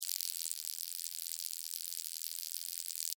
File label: Insect Wings Fly, Steady, Bug